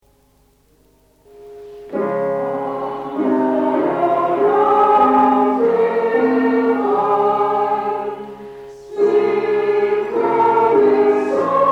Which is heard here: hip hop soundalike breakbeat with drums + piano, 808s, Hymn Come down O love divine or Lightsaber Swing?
Hymn Come down O love divine